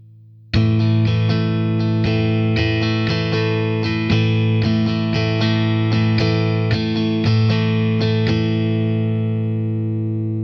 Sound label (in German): Schlagmuster Übung, gitarre